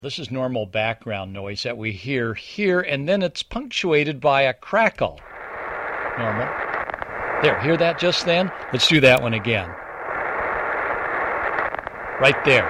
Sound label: lightning, noise